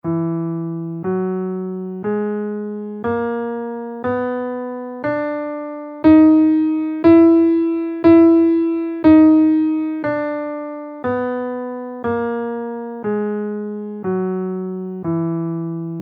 lydian 6 scale in E, guitar

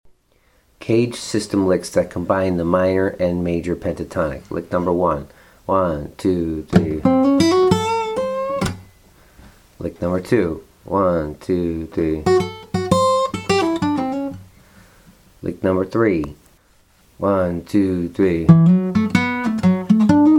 CAGED licks mixing major and minor

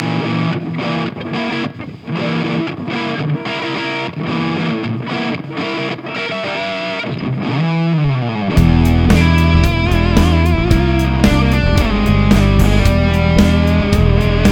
super locrian

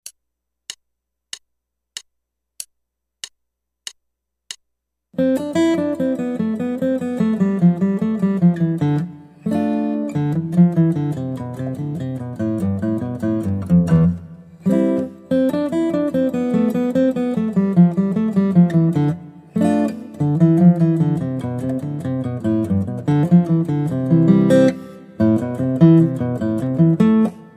Guitar

